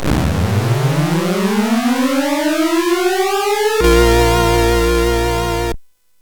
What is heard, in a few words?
Quartet, CSG8580R5